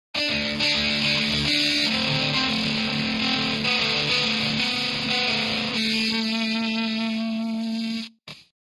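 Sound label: Electric Guitar Rock Arpeggio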